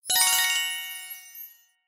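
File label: Symbol Bonus Win; Steam Miners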